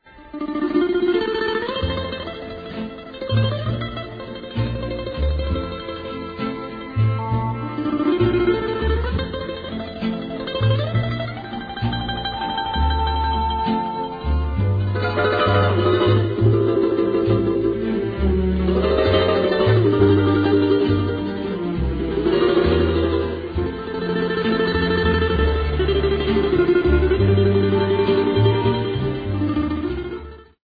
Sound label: Instrumental; Toys in the Attic